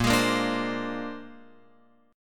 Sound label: A sharp 9th A sharp Guitar Open F; strummed; JGuitar